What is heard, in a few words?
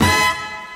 chachacha